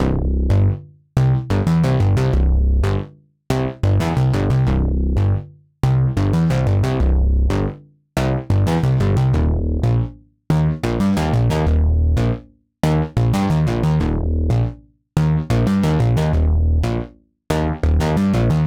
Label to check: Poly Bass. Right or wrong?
Right